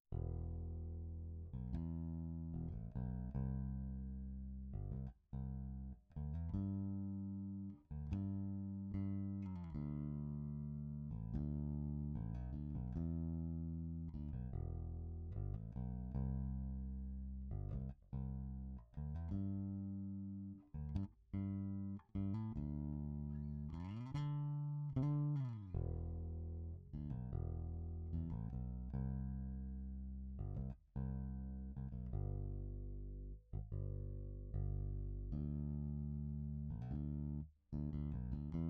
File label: jensen bass